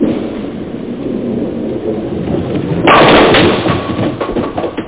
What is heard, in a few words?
BOWLING; Epic Interactive Encyclopedia